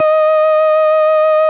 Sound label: FARF, FARFISA